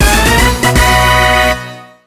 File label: Jingle ~ Score!; mario super sluggers; wii